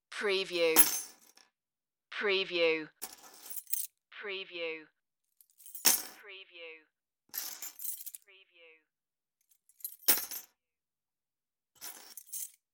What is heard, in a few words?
keys on table